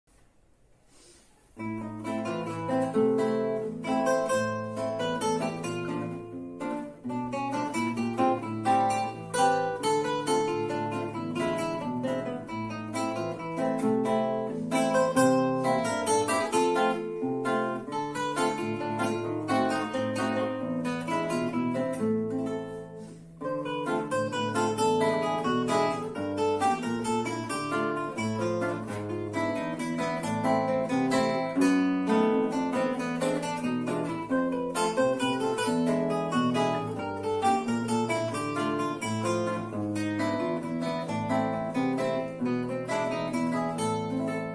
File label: Bossa Valse, guitar